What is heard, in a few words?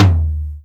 drum hit whistle